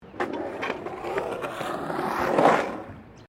SKATEBOARD SKATE BOWL THROUGH BOWL; Skateboard sounds; Skateboarding (Sound Effects:Sports)

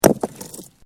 Bricks Movement Minor

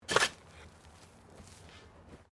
Digging Shovel Sand; Various digging tools and processes; Construction Sound Effects; Construction (Sound Effects:Backgrounds)

